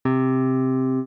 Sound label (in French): basse; TamTamMicro.activity